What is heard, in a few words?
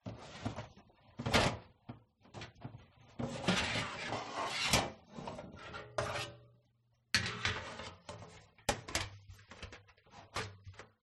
glitched tones baking tray clatter